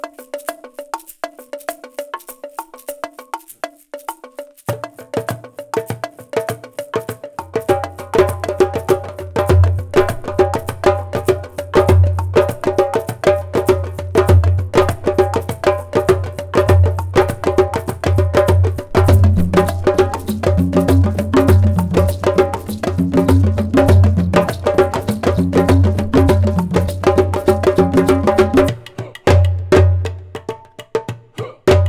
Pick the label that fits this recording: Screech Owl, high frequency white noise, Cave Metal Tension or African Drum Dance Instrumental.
African Drum Dance Instrumental